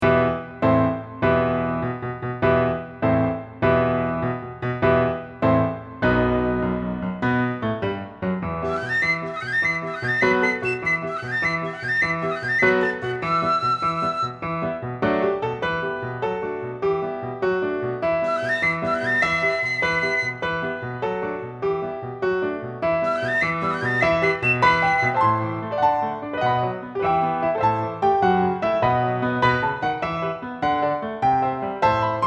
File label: tarantella acc; two part; partbypart